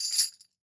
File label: Tambourine Half; World Percussion; Cymatics; Emperor World Percussion